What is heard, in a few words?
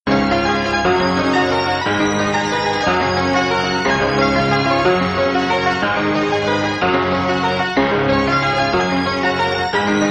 Instrumental